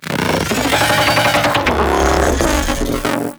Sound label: Taunt; Warframe; Corpus; Jackal Redux